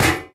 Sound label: physics turret gibs